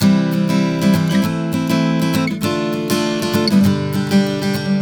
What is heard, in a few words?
Guitar, Acoustic